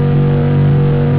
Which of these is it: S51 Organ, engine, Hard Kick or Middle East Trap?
engine